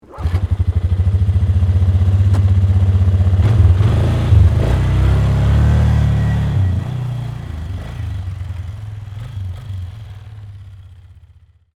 ATV Away, Sports, Atv, Start, Up, Pull, Away, Polaris, 500Cc, Four, Wheeler; Blast Wave